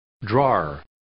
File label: drawer